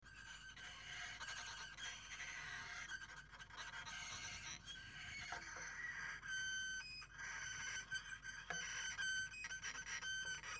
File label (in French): archet grince, harpe; touchers de la harpe